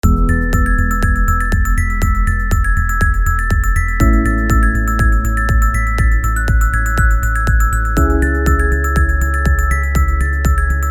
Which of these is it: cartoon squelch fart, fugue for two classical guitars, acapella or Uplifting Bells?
Uplifting Bells